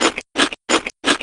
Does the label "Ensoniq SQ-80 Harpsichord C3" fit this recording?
No